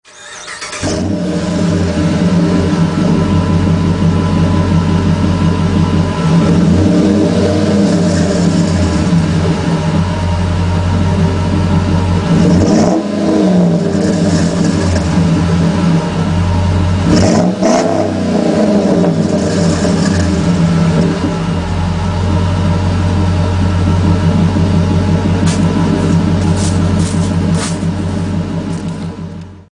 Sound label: 50 series hd heavy duty mufflers aggressive sound; flowmaster